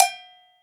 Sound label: Cowbell; 7rystan's 600+ FREE EDM SAMPLES & DRUMS; Percussion